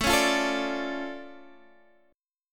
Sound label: D Minor Major 7th, A, guitar